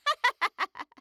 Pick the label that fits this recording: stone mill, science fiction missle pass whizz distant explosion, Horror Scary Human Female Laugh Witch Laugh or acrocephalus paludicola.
Horror Scary Human Female Laugh Witch Laugh